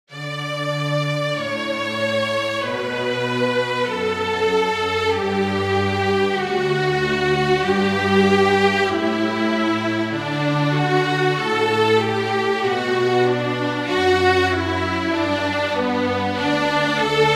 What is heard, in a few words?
Canon for Duo of Violin and Cello